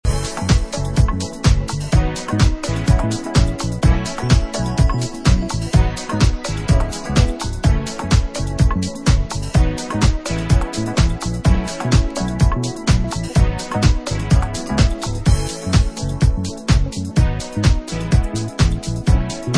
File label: Instrumental